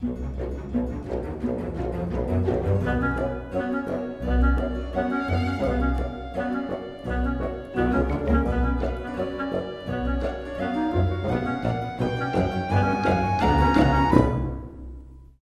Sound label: sting ghost appear; The Sims 3; windows gamerip 2009